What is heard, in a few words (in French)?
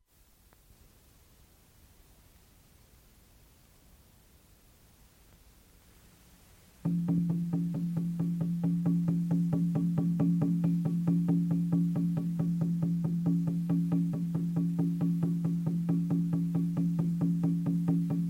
Tambour pour voyage chamanique